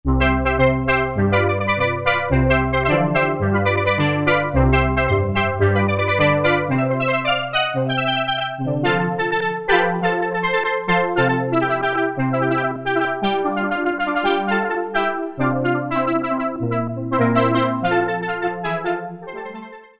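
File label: StlDrumBnd, Roland MKS-50 steel drum band; Factory examples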